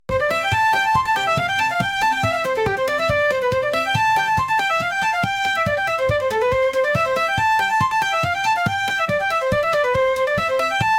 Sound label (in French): Instru fête; la fete au village